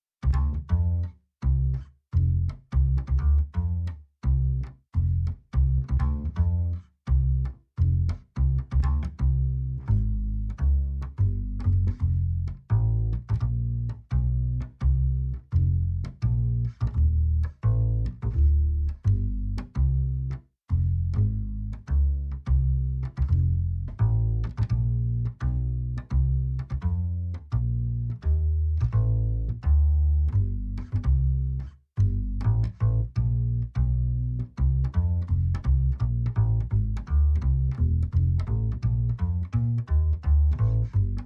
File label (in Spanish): contrabajo